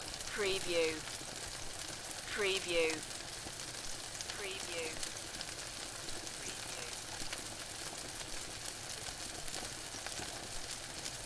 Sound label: Frying And Boiling Loop